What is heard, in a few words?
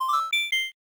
Buy Item; curse crackers for whom the belle toils switch windows gamerip 2022